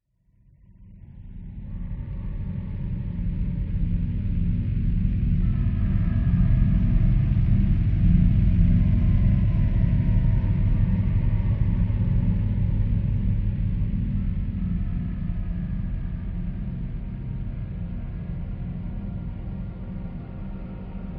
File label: horror atmosphere dark sinister depressing choir drone; scott lawlor